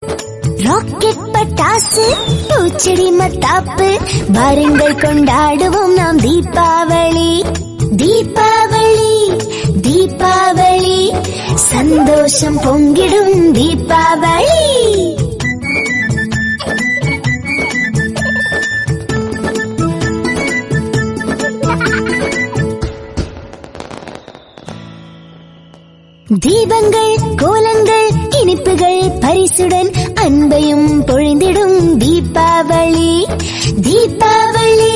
DIWALI SONG RINGTONE TAMIL

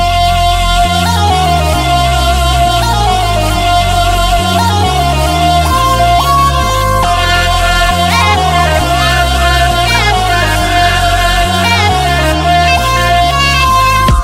BGM RINGTONE, TAMIL

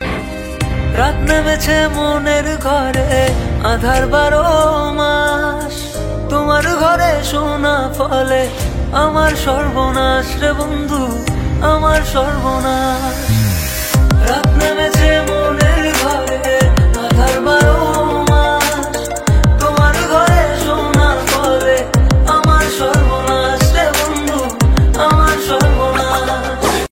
ACOUSTIC RINGTONE

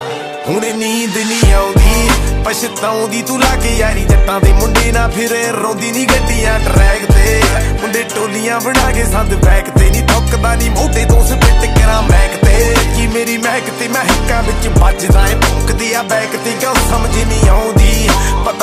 PUNJABI RINGTONE

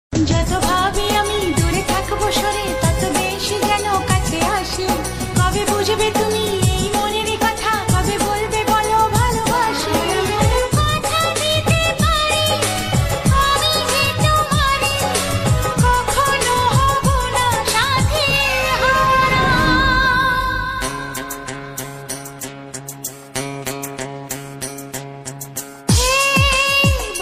BANGLA GAAN NEW SONG RINGTONE

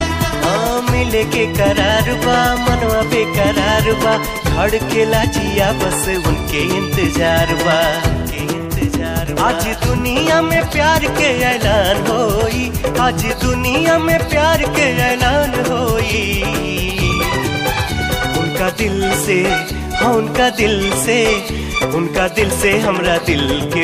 BHOJPURI RINGTONE

